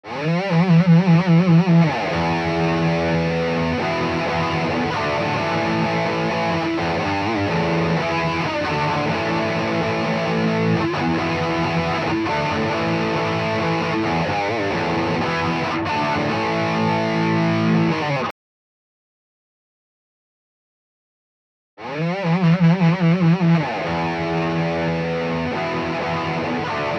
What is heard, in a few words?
E Guitar